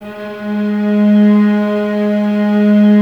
STR VAS G#, Violas, No Vib; Miroslav Vitous

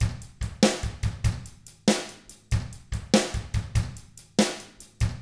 ROCK 6/8, Drums; ILIO; Double Platinum Drums 1

